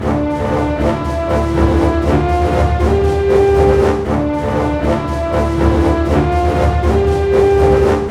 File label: 120 READY, Scoring Tools ORC Ready Fight